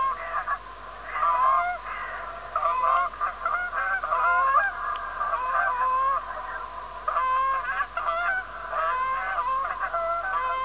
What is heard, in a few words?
canadas